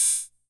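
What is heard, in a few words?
open hi-hat, rhythm 55; classic machines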